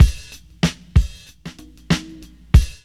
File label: LOOP; E-Lab; X Static Goldmine 2; akai